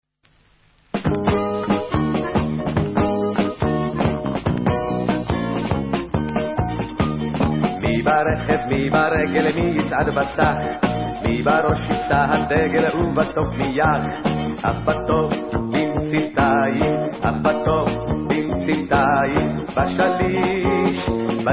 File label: Vocal